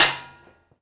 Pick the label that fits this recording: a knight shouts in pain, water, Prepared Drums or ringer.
Prepared Drums